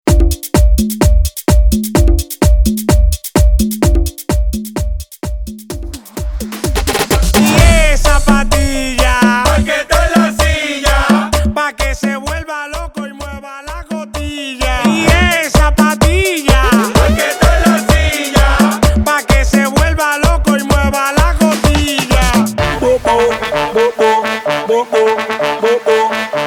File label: Intro Verso 128 BPM